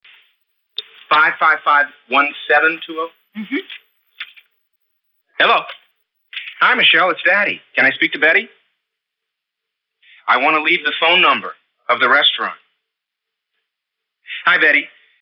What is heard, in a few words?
Tone Pulse after